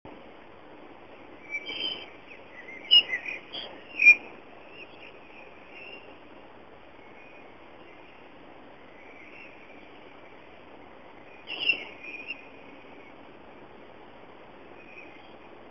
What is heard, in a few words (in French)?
Ambiance Vincennes